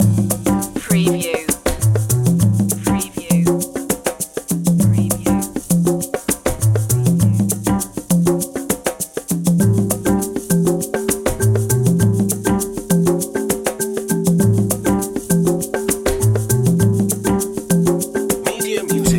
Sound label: jazzy opener loop